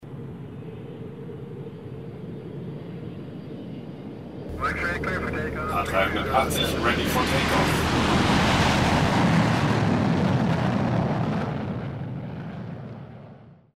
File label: atmo